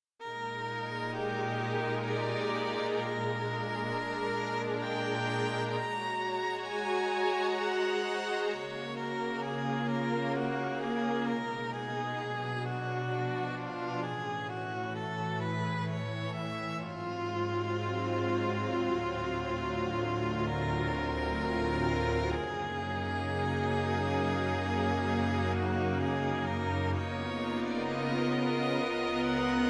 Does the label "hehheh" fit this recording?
No